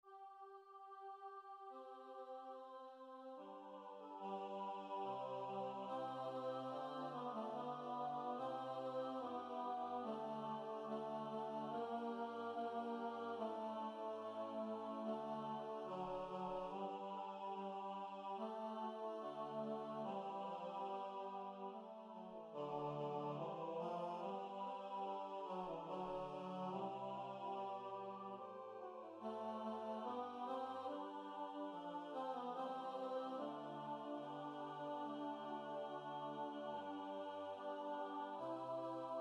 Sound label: Mass Agnus tenor; Agnus Dei